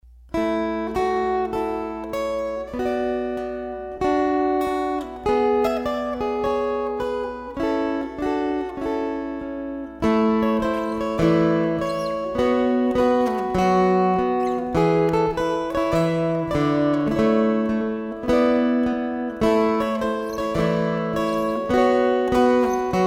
String Choir

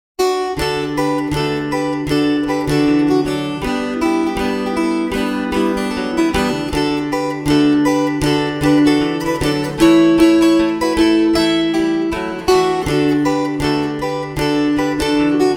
Rag fast

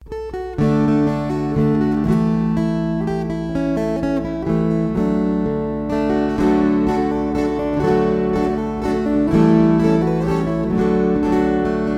Waltzes

